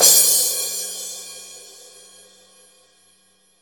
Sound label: CRASH; Best Service; Real Mega Drums VOL-1